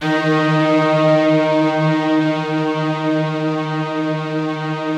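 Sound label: BIG ORCHESTRA D#2; Yamaha VP-1; Music Store; 90's Sample CDs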